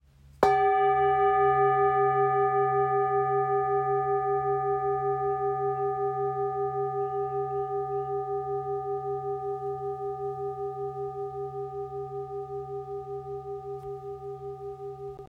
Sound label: Dorje bowl stick, Sound Bowl; Dorje design 26cm